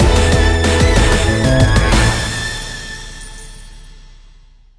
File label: big Win Loop End; wild worlds